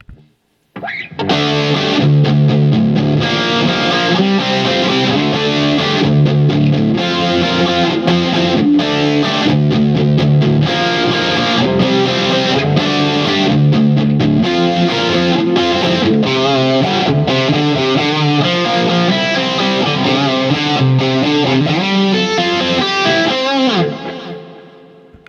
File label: Guild Bluesbird; 1997 Guild Bluesbird guitar from the Westerly factory